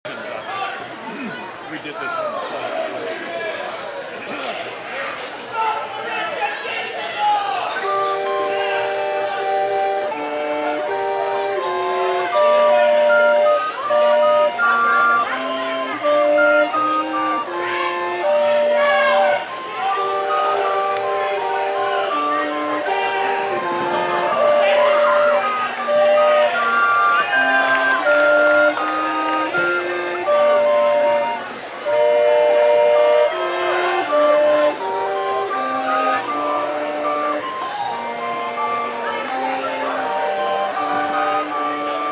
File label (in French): flutes a bec